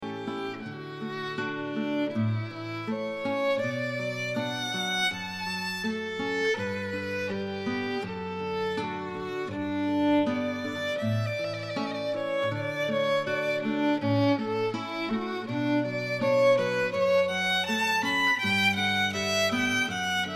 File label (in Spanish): canción canon interpretada por violin y guitarra